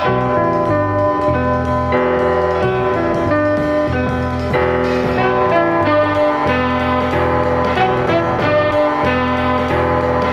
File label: piano and horns, Loops; Phonky